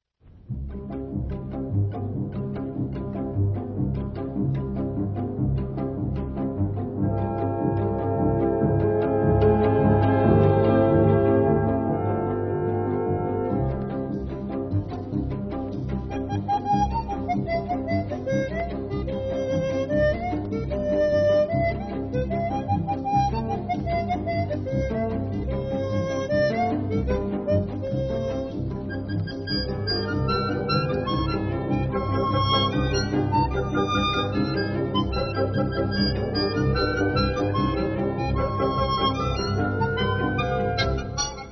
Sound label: Jamaican Rumba, harmonica